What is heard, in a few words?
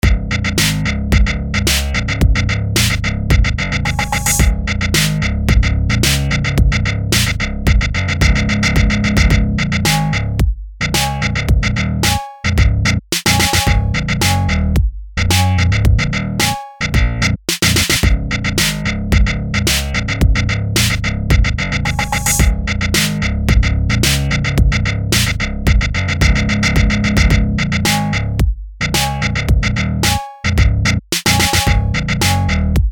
bass git